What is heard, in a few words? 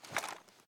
reload empty arm down, reloads; gmod